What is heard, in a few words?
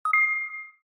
CORRECT chime